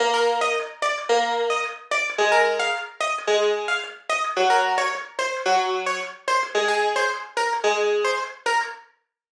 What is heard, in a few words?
Hybrid Standard Ethnic; midi wizard 2; Unison